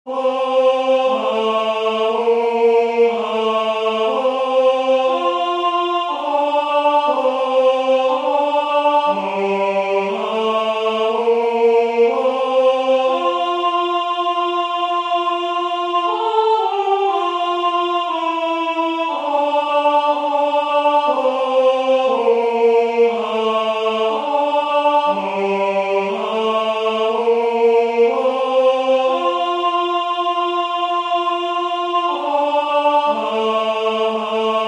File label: o little town of bethlehem all alto